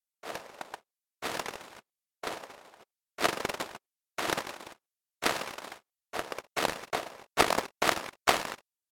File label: ice; NIW: Natural interactive walking